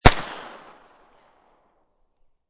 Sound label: MP5 9mm Bridge, Machine Gun; VRSonic; Guns